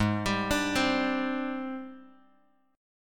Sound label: Ab Major Sharp 11th Ab down Bass Standard; AbM#11 arpeggiated; JGuitar